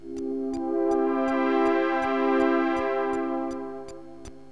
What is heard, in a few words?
MAJOR, SYNTH